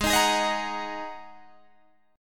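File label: A flat 7th Suspended 2nd A flat Guitar Drop D, chord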